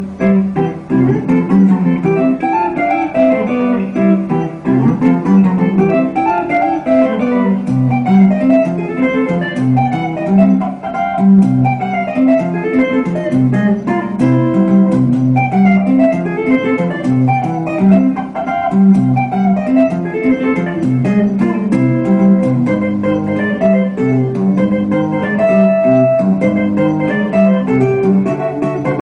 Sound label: guitar ringtone; super mario